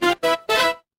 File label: feedback level up